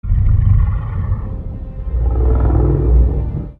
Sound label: growl; godzilla 2014